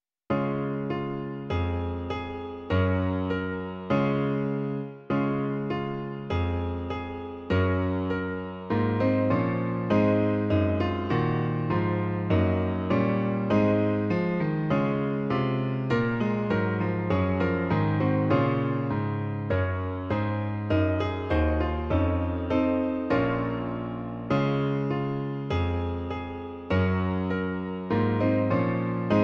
klavír

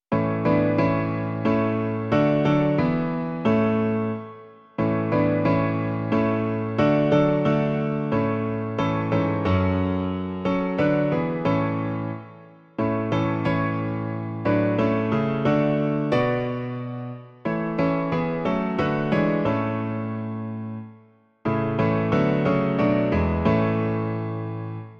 klavír; Klavírní verze

